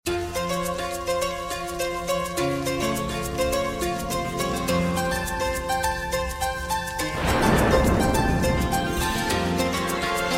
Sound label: INSTRUMENTAL RINGTONE